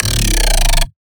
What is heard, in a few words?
Bass Shot X Gnarly, Dubstep; Ghosthack; Advent Calendar 2018